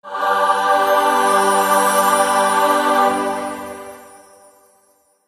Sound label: Angelic Tune